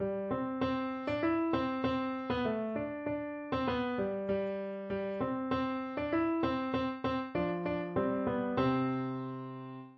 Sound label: muffin man piano